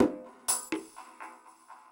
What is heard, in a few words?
PERCUSSN ETHNO 125, Drum